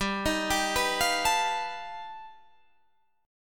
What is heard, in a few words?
G Augmented 9th, Guitar, Open D